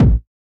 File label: 808 & 909 Kit BD; Roland Juno-D